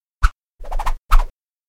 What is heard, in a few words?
whoosh